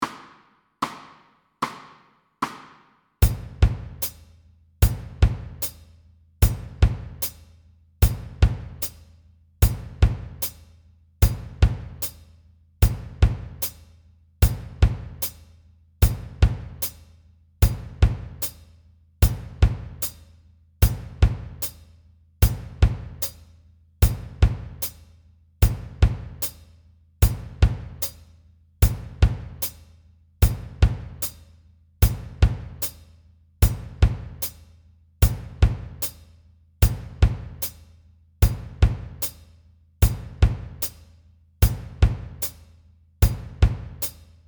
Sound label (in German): UND betont Vierteltakt